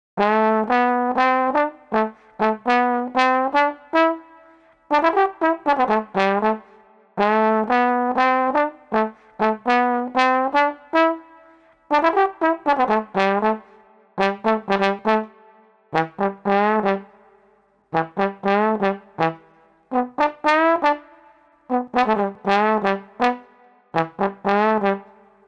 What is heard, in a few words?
Street Trombone Bouncy 122